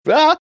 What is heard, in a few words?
waah